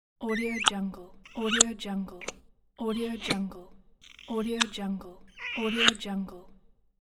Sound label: kiss